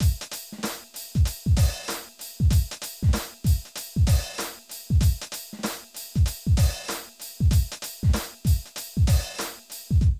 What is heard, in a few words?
Drum loops, Hip-hop